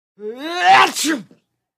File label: Male Build Sneeze